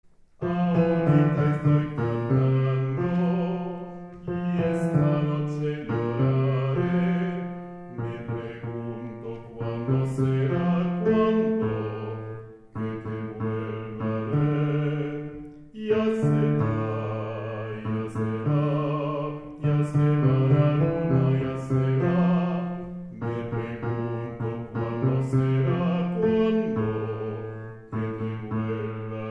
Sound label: Basses